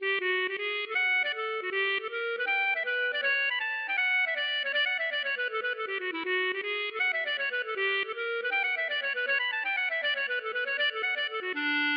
hornpipe

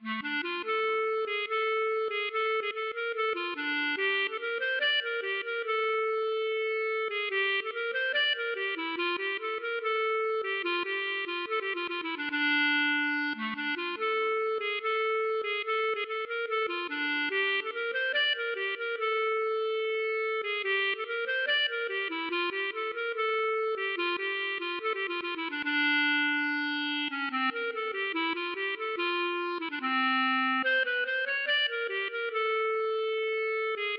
klezmer Sirba

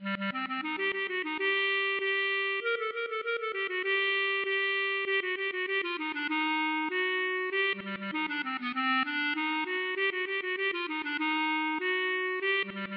klezmer

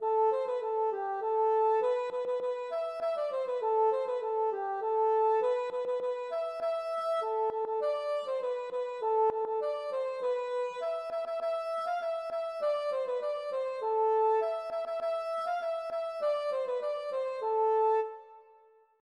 hanterdro; Kervignac

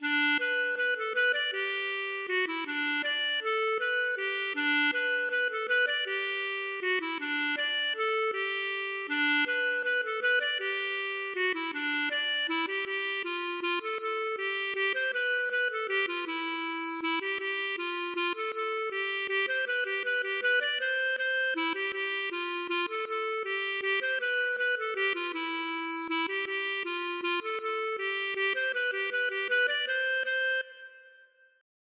mazurka

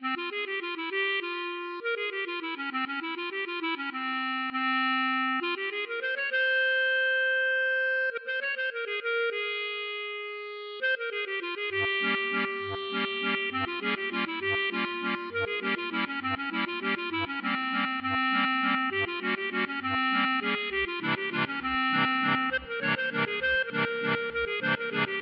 klezmer

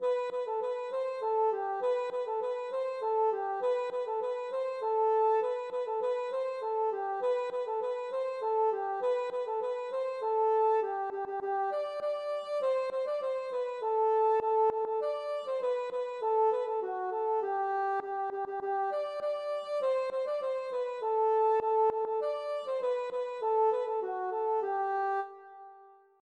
hanterdro

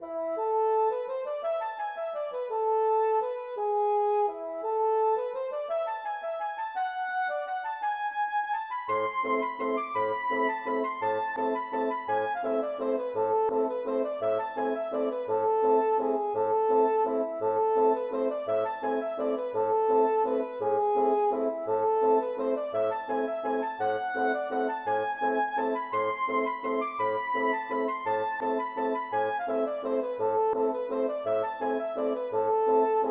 klezmer

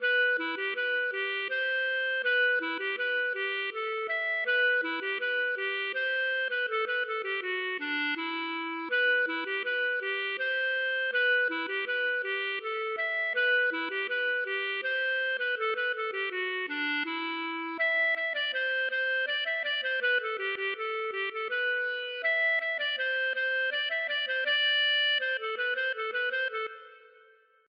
hanterdro

